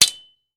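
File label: Sword Clanks Single; swords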